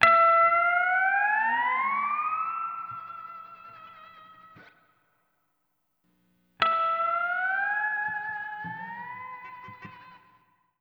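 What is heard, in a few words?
Steel Guitar, E; Zero-G Kocktail Kollection; Construction Kitz (Lounge); Volcano at Sunrise 074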